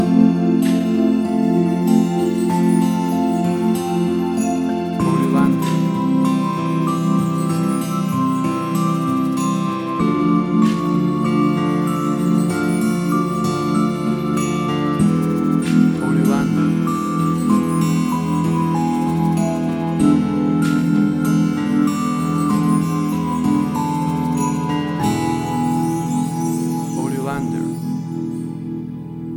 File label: Babies Music Theme